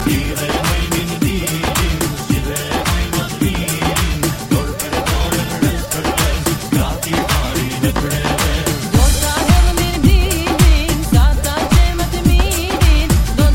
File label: Albanian Remix